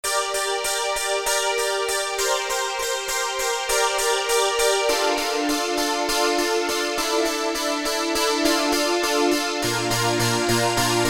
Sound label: GLASS, Korg DSS-1; Multiple Inst